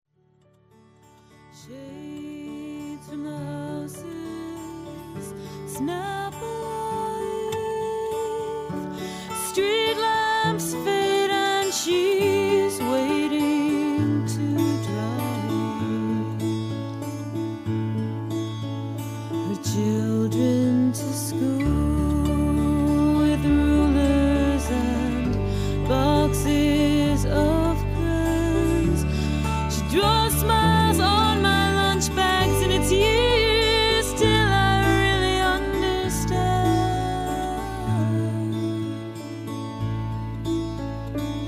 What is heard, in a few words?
lullaby